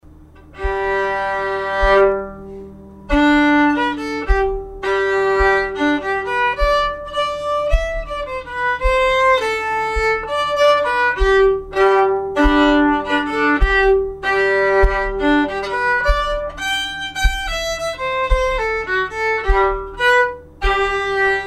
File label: Schottis slow, fiddle